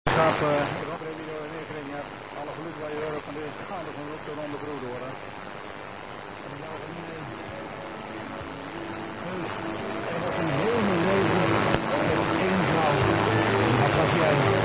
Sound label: 16470khz unid; kortegolf